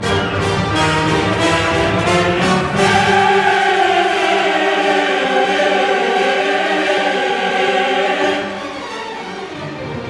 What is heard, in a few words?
Super Feature Music; Burnout CRASH!; Xbox 360